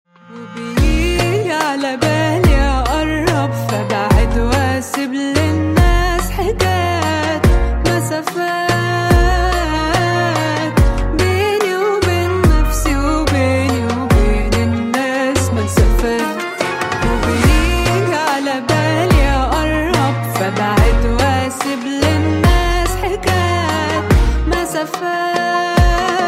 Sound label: ARABIC MUSIC RINGTONE